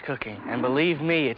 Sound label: grunt; One of Our Pylons is Missing